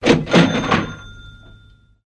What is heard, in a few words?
cha ching sound